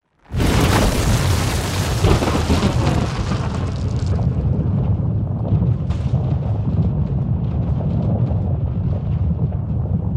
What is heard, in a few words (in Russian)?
vizualnij effekt vozdejstviya vsplesk teksta nazvaniya logotipov